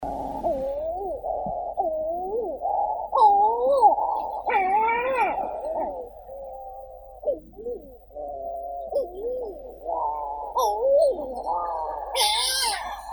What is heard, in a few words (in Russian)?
burevestnik serii puffinus griseus